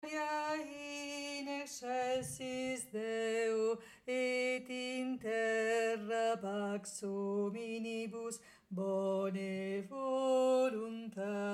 gloria rit bassi; anno liturgico

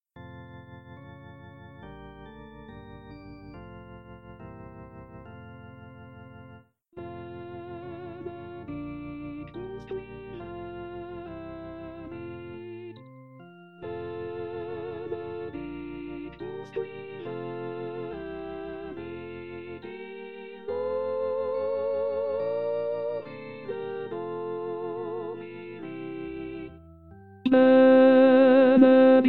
Benedictus Tenori; Missa Brevis; Gounod; messe

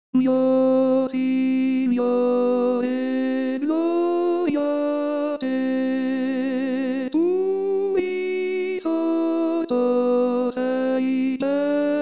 Mio Signore Gloria a Te Bassi; pasqua

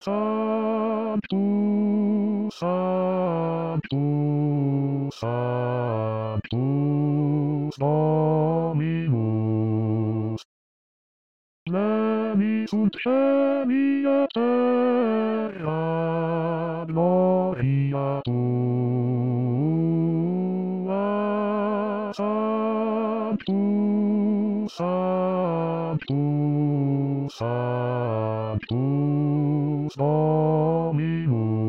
Bassi; Sanctus Di Lourdes

